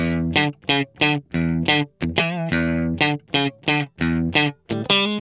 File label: GUI 90 LICKS